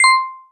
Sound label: pikon